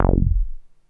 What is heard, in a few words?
NASTY BASS; Masterbits - Vintage Voltage; VV BASSES